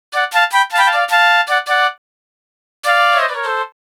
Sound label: Flutez D; Lounge; Zero-G Kocktail Kollection; Construction Kitz; Flutes & Swingers 156; 90 s Sample CDs